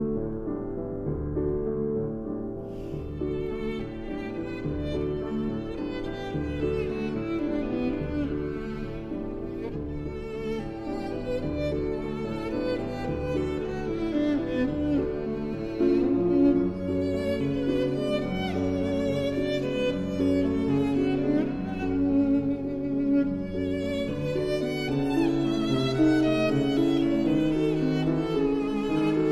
Violin and Piano in D

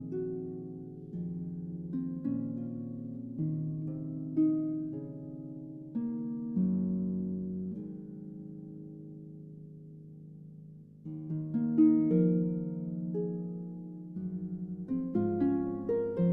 Harp Concerto Lullaby

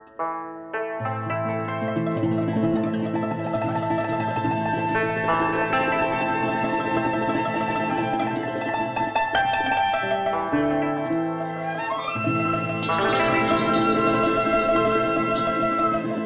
TRADITIONAL Chinese, Picking Flowers

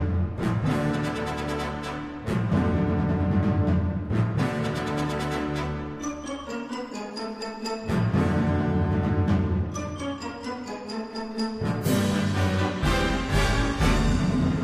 Orchestral Suite; Flower Drum Song

